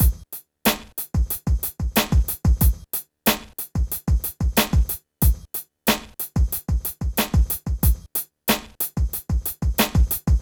PHATT BEA

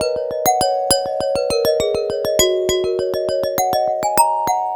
Fast Glass

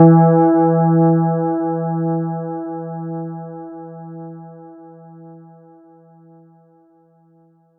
Chord; ModelCycles

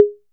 HI CONGA 808; E-MU Formula 4000 Series Vol. 3; Analog Odyssey

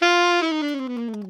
ALTO LNG FL F 3, Sax; Giga Samples Collection; ALTO STEREO

